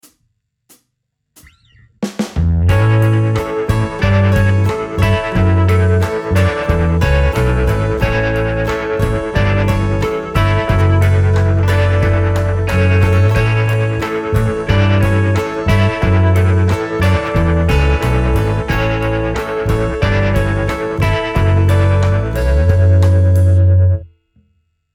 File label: Example Backing